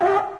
nature asterisk; windows 9x; sound schemes

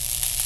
superphys small zap; Source Engine; video game; weapons; physcannon

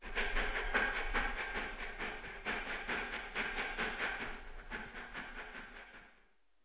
dog pant behind wall, animal; ambient; Source Engine; cstrike

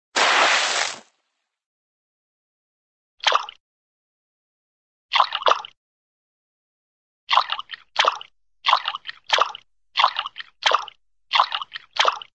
water, splash splash water on man, cartoon

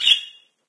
jungle beep; windows 9x; sound schemes

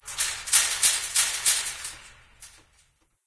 random metal shake; ambient; Left 4 Dead 2; Source Engine; random amb sfx

